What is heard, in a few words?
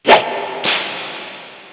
WHIP